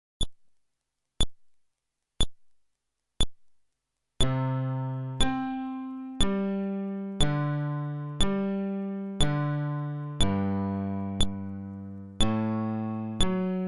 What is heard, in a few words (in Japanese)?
フレットレス